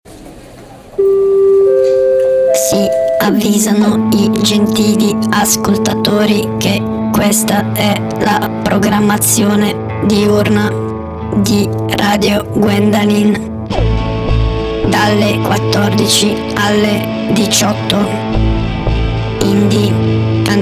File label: Jingle; rotazione Diurna